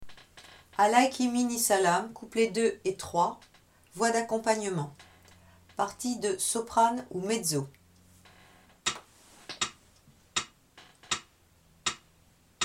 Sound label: accomp alto